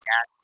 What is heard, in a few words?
gat Tone